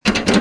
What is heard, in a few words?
wheel Shake; merge-dragons-2